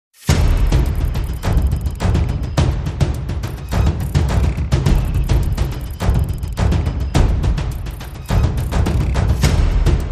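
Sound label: Percussion and FX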